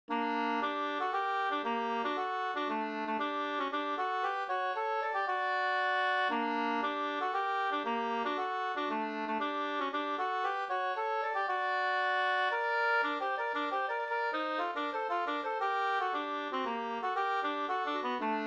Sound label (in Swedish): polska, 2st; Axel Sundstrom Molltorp SvL Vg31